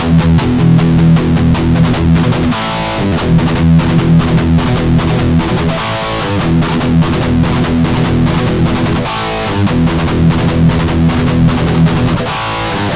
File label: Guitar Licks